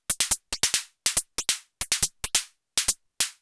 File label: Anabeat Hat